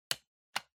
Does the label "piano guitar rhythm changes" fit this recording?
No